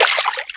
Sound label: splash; dog Ninja